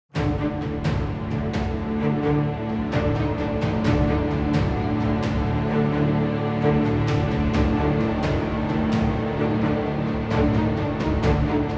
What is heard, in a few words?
suspense buildup percussive